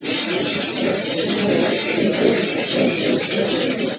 crowd

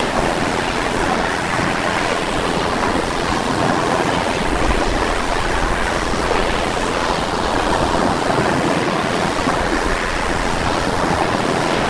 waves

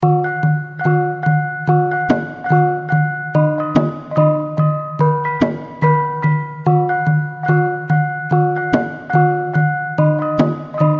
bonang, GAMELAN

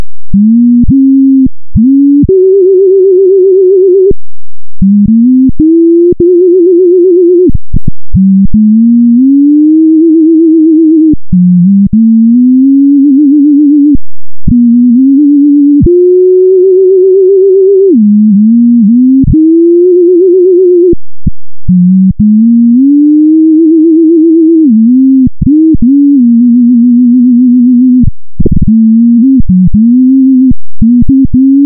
f0 Orig resynth Sin

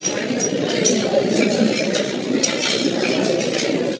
crowd

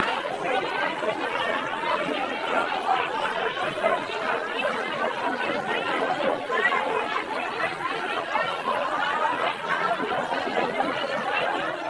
laughter

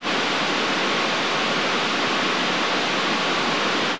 rain

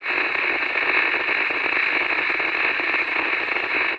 static

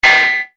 METAL ZAPP; ecrins; Mars

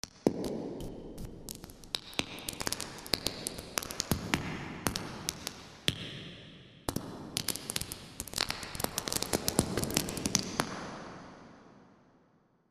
Large Warm Hall; reverbs; M3000

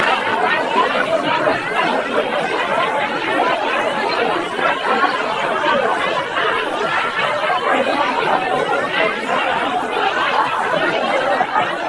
laughter

